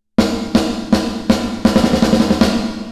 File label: Plate Damper Frequency 10.00kHz; UVI